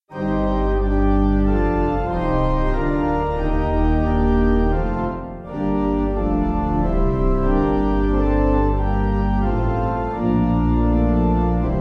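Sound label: pipe organ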